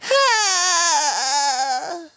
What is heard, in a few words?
dying; toad; sm64coopdx